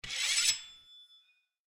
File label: Draw Sword; soundscrate